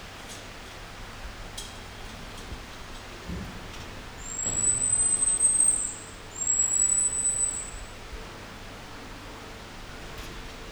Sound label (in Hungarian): repülőkutya is, vánkozben, indiai repülőkutya; állathangok; nyíregyházi zoo 2010